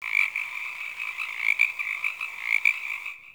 Beach Night Frog; Warframe; Grineer Beach

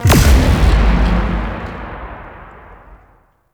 Power Core Laser Activate; Ambience; Warframe; Corpus Gas City; Gameplay Remaster

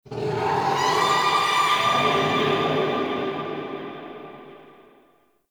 Growly Overtone, Ambience; Warframe; Shadowgrapher

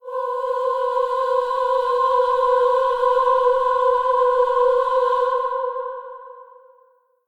Kuva Lich Requiem Mod Choir D

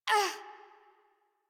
Generic Short Pain Operator Voice; Dialog; Warframe; Operator Mission

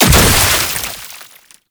Boss Ranged Weapon Projectile Explosion; Enemies; Abilities; Warframe; Oraxia

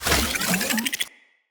Lightning Grenade Charge Up; Weapons; Enemy

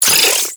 Low Clip; Weapons; Warframe; Tenno; Oraxia

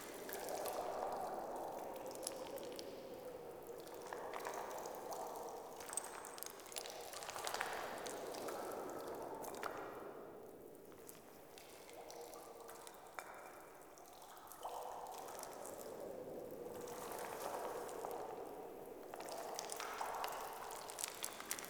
Interior Bed Amb Loop, Ambience; Warframe; Awakening Remaster

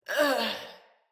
Death Female; Battle Barks; Envoy

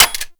Pistol Mech; Kohm

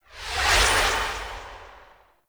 Cargo Train Exit Warp Portal Close; Corpus Railjack

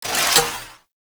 Shotgun Fire Stop Mech; Warframe; Tenno; Alchemist

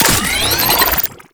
Alt Fire Charge; weapons; Scaldra AK

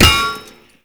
Shield Impact; Tank; Warframe; Lasrian Tank

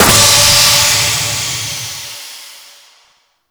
Steam Heavy Burst, Shipyards; Ambience; Warframe; Grineer Shipyards

